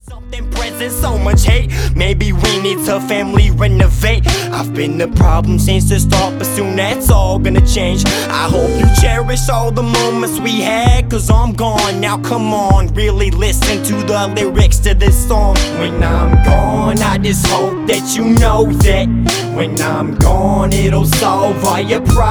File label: Rap